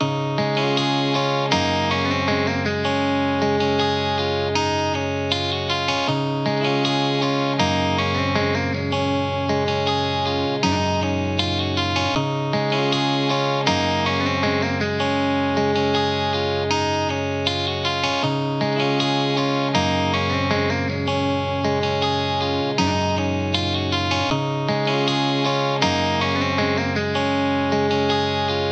guitar

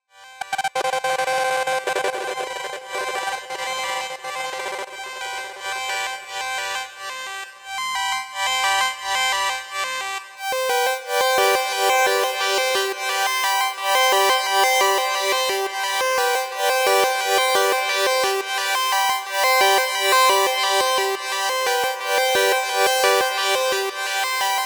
synth

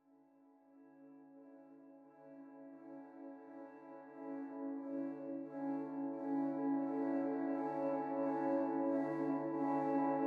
main pad

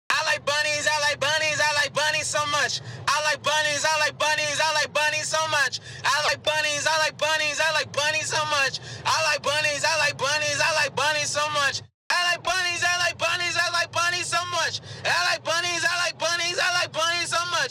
160 vocals hook high

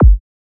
kick lasery

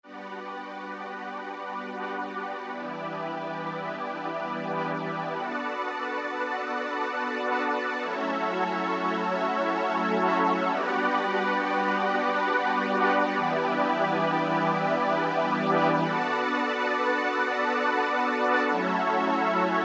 pad

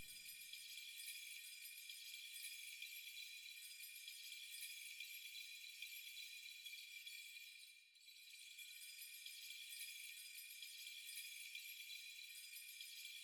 texture

